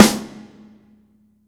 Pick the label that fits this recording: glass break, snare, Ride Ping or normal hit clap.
normal hit clap